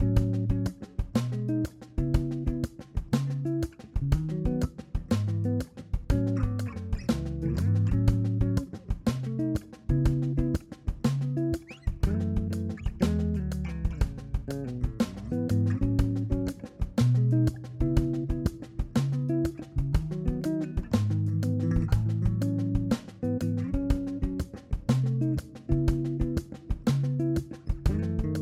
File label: Logic Pro Mock Up; This audio is an early-stages mock up of the same piece; The All